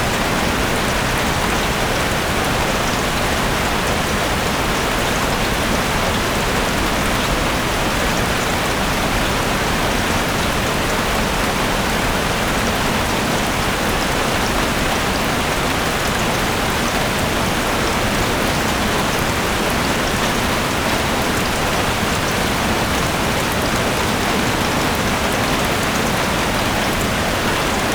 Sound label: rain heavy; WAR